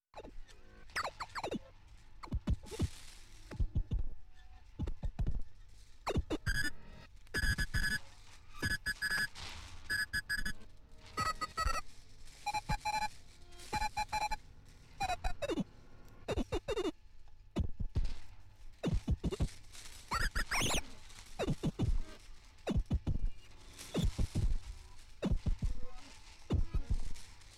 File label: Rua da Alfandega, Lisbon